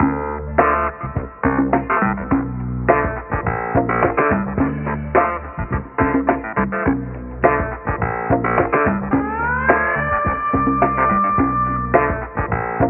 keys, phonky loops

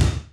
birch, Misc Percussion Ticks

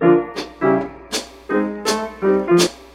piano, Loops; Phonky

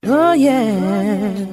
"oh yeah" SUNG

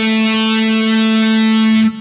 stroke, Guitar; Notes

